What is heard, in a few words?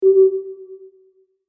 Sonar Echo